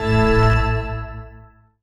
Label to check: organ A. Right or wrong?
Right